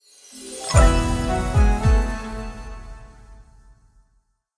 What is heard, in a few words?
Deduction Success; jenny leclue detectiv